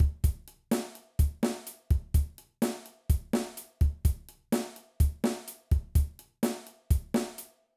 Rock Drum Beat

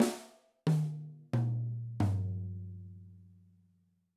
Quarter Note Example

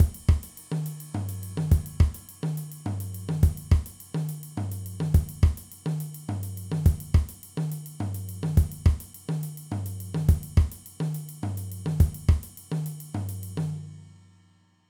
Afro Cuban

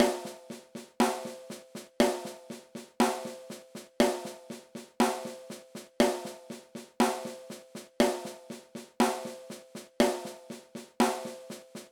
Rim Shots Exercise

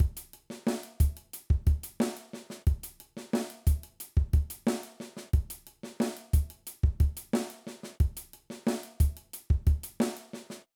Intermediate Drum Beats

